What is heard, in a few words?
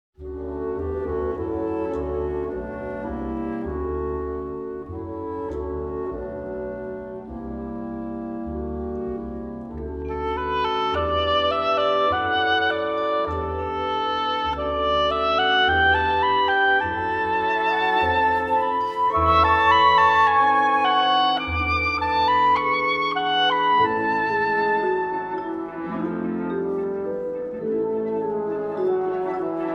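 Concerto No. 2 for Oboe, Wind Symphony; Mvt. 1. Impromptu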